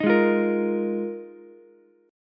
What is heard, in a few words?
F Minor 11th, Ukulele C6; Fm11; strummed; JGuitar